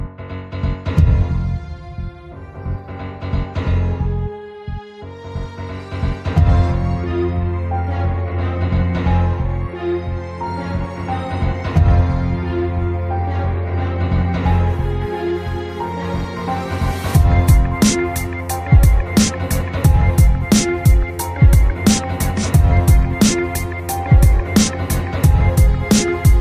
hip hop

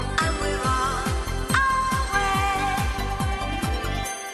bing and bong; children

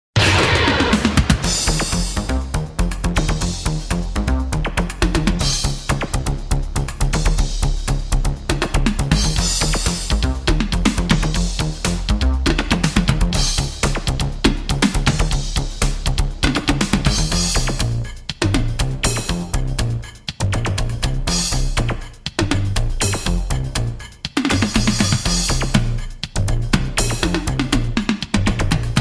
Bounce Beat

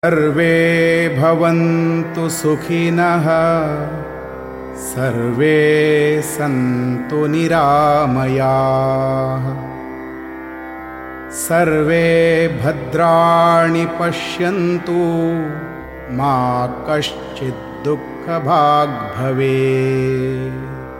shanti mantra

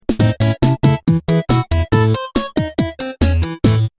8 bits sound tune

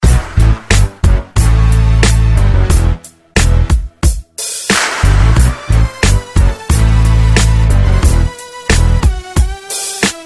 gangsta beat; hip hop

